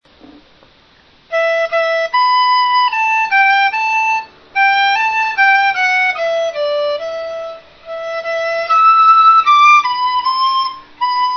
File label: dizi